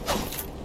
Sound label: dagger miss; Dark Souls III